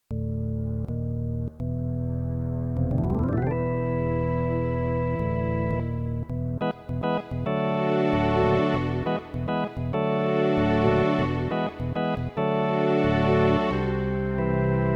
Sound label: electric; Chip